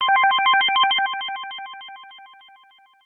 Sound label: Pure Tone; samsung touchwiz